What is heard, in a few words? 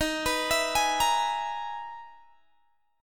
E-Major Sharp 11th Eb